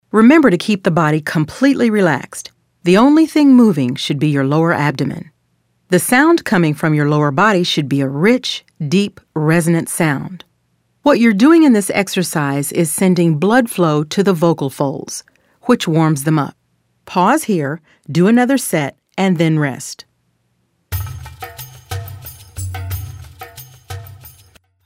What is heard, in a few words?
Vocal Fold Warm Up